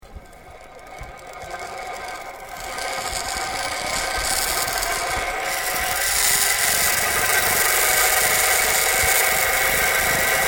Flying fox Travel Fast Loaded, Playground equipment; Sports Sound Effects; Sports (Sound Effects:Backgrounds)